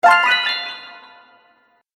Magical Surprise; Magic Sound Effects

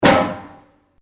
METAL BOX; Metal (Sound Effects:Materials)

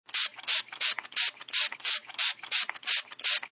Spry Botle, Spray bottle sprays ten times; Spray Bottle 10x; Cleaning Sound Effects; Sound Effects:Household

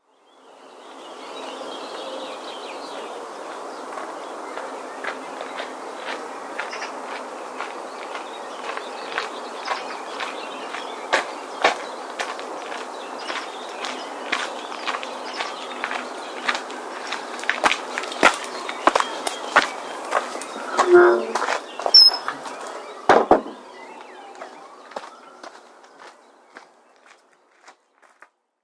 Gravel Path Walking And Opening Wooden Gate; Outside Ambience; Footstep; Andrew Fleming

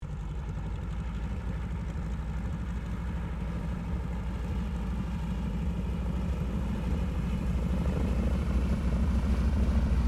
AIRCRAFT AT-6D HARVARD MK III TAXI; Temora Harvard MK III; Airplanes; Vehicles; Airplane Sound Effects

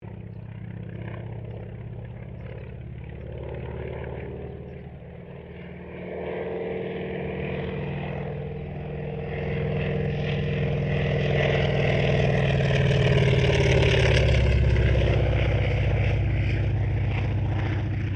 AIRCRAFT CA-28 CERES PASS; Airplane; Airplanes; Vehicles

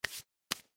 Human Hands Wipe, Wipe Hands Pat Dust Off; Shaking; Objects; Blastwave; Humans

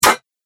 Knife Pierces Armor; Knife Sound Effects; combat sound effects

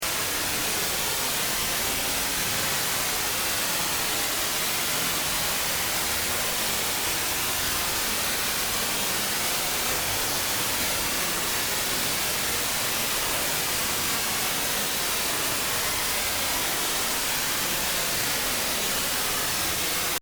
Looping Static Noise Drone; Static Sound Effects; Andrew Fleming

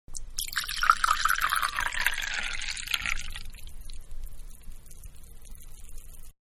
Wine-Pour Into Medium Glass; Pouring; Objects

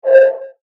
Sonar Science Fiction Effect; Andrew Fleming

